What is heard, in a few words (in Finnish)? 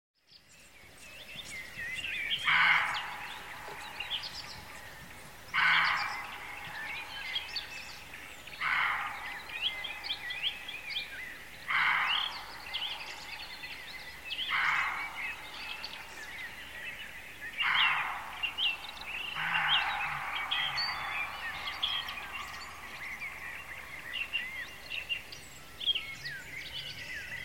metsakauris; nisakkaiden aania